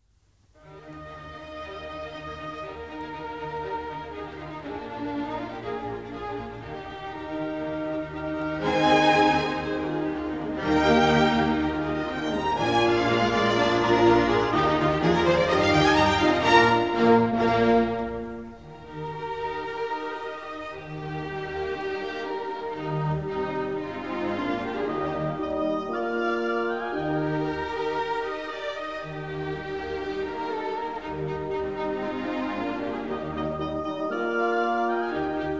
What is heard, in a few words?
Horn Concerto No.2 in E flat; Mozart Horn Concertos